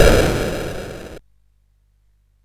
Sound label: sid CSG8580R5; Castle Master